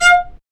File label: STR, Vc Marcato; String Master Series; Vc Marc&Harm; Vc Marcato 1